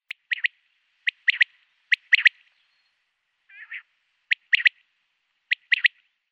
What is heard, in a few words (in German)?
Wachtel Coturnix coturnix, Vogelstimmen